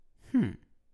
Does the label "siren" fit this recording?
No